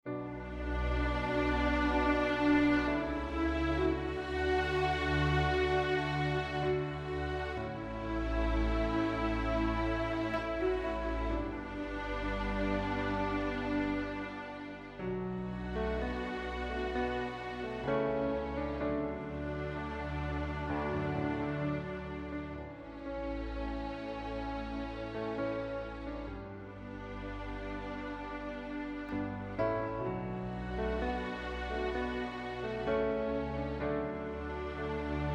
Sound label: strings and piano; orquestra de cordas e piano